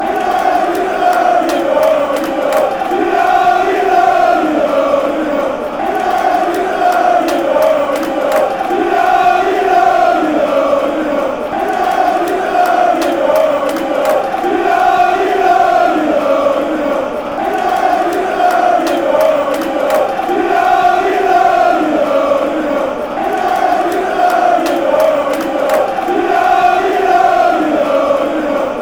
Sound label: fan chants; e i yido